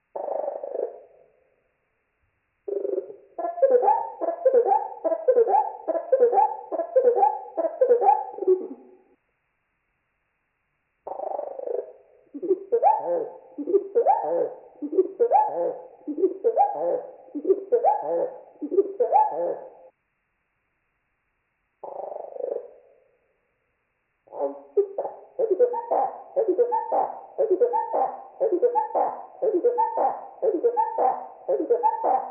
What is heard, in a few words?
slowed 8x East genes East songs, Marsh Wrens; Donald Kroodsma; Eastern Marsh Wren